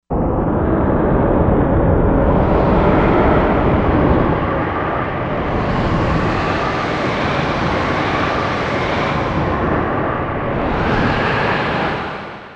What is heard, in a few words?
Storm; Korg MSC-16S Environment